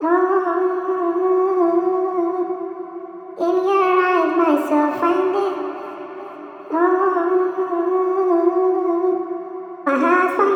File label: Vocal Loop - 143 BPM B Min; In Your Eyes; Cymatics; ANALOG EVOLUTION Beta